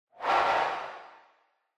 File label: Camera Motion, High Reverb; カメラモーション; 高 リバーブ; シーン・カメラモーション